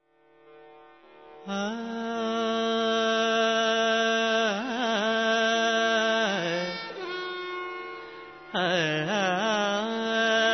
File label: Tamil Classic; RKC Live Stream; Indian Artists